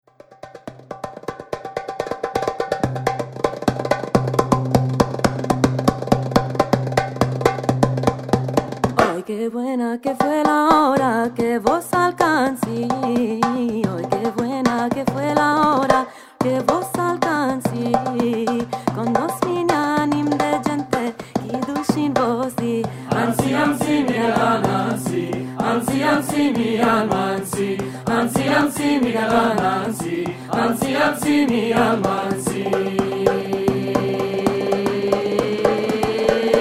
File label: bulgarian sephardic